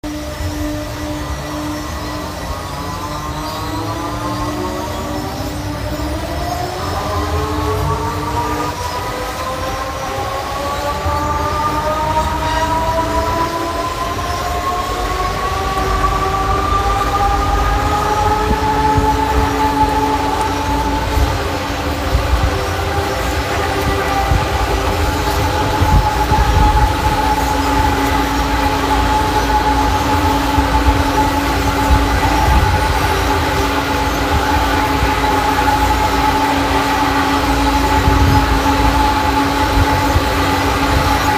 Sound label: Break Dance Motor Sad; Kermis Breakdance Jingles Motoren